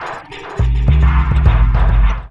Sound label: Metals Bumpers; Sonic Foundry (Sony Creative Software); Esoterik Beatz